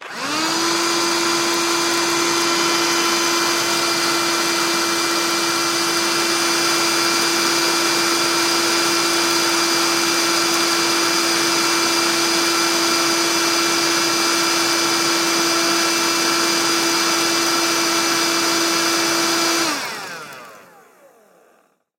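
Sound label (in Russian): zvuki ruchnogo pylesosa, handheld vacuum cleaner sounds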